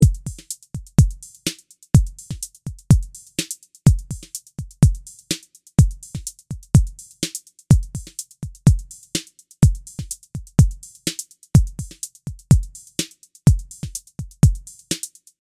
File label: drum